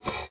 GUITAR FX; Xsample VOL-2

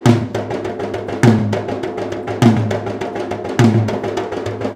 PERC, India

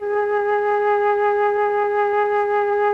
MLTRON FLT, MELLOTRN

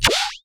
BASS ONE SHOT WASH

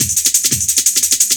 LOOP, Drum & Bass, 174 BPM; Ueberschall; Drum & Bass Flash Trackz

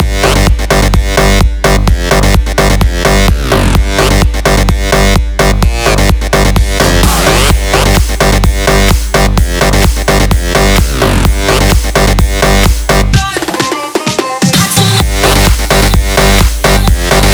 128BPM Blue Eye Kit MIX, Root G; Vengeance - Essential Clubsounds Vol.5; Demo Constr Kits

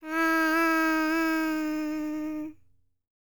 HIMALAYAN, World Voices; ILIO; Vocal Planet VOL-5

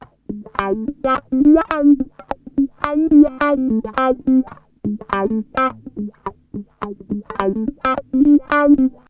GTR; USB Soundscan vol.31; Miro s Grooves; AKAI

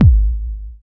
DR. LOOP, DRUMS; X-Static Goldmine 3

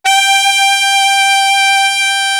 big sax, M1

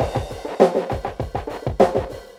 drloop, drumloops, Vinyl Attack; Masterbits

